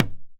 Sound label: Madal; Polyend; Simulacrum Sounds; 1 Shots; Acoustic and Electric; Drums